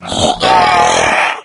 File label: death, maggot; doom3